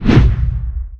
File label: preattack; dota; axe